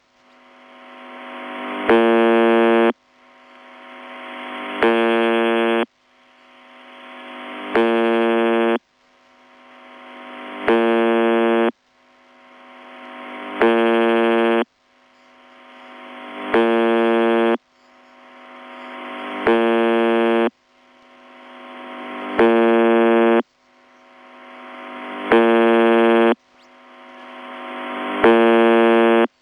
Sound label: buzzer